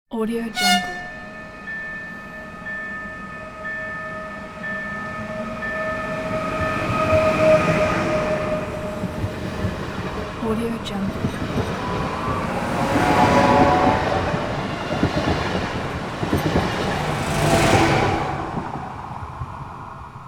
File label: quick train departure with horn